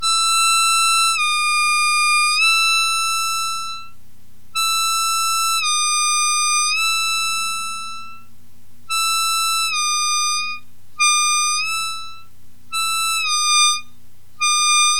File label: bending, harmonica